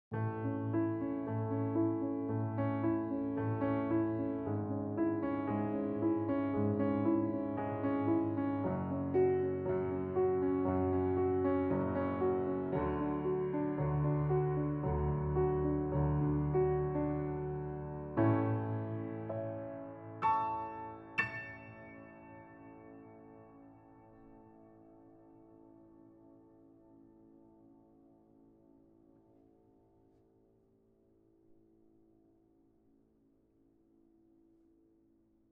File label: Piano Init